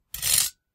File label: sword Slide; Foley; Kenney's Sound Pack